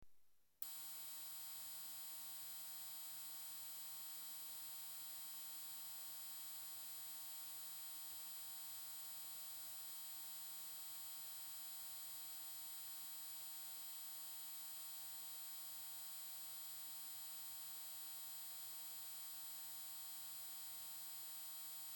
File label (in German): Sinus 20000 Hz; Sinustöne; Ongsiek Haustechnik